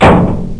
Hit bounces off; HAVOC

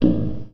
dong

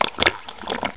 drink; duke3d

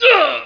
hit; SPECOPS

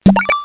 toca

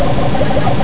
new pipe; Monster Media Number 15

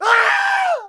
Critical Stop; 250 Desktop Themes; Quake

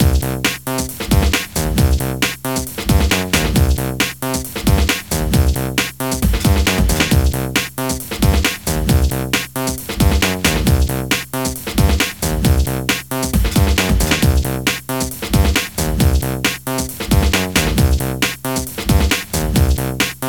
broken beats

